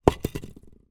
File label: foley concrete block light movement wobble hard ground; zapsplat